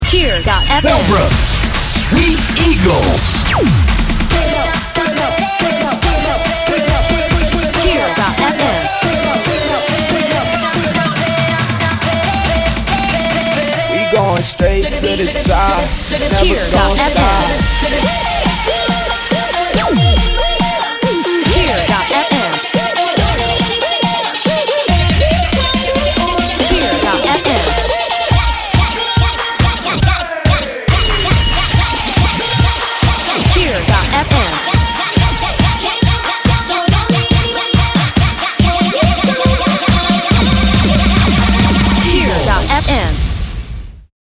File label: intro with loop